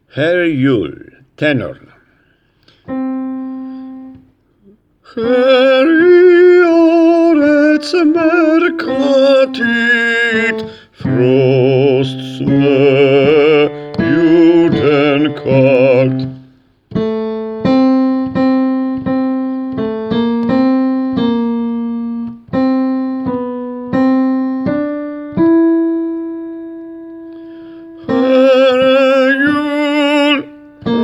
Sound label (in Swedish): tenor